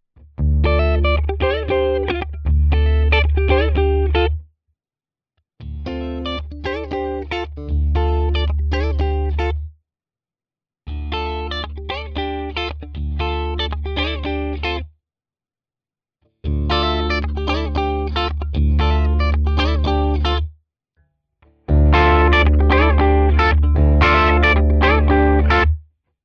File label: clean all positions